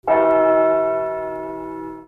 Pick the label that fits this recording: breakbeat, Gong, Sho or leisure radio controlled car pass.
Gong